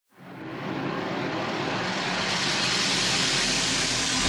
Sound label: Reverse FX 140BPM; Sound FX; G minor; Vengeance Samples; VTS1 Devastating Truth Kit